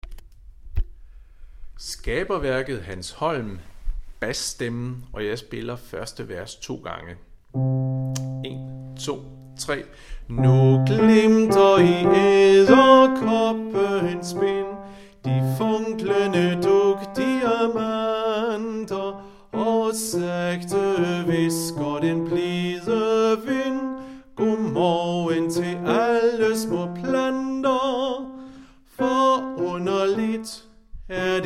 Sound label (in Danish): bas; Skaberværket; harlev koret